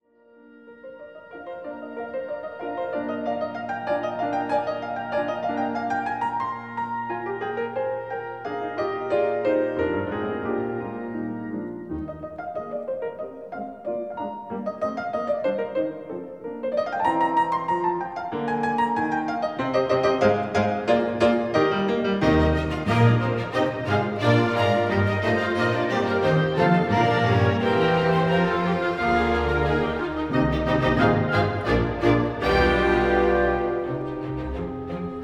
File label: Concerto In E Flat For 2 Pianos Rondeau Allegro; Mozart; K 365